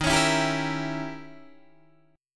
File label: Eb 7th Flat 9th Eb, Guitar Open D; down